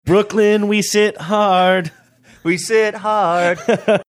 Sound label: singing brooklyn we sit hard